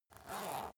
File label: zipper use slow short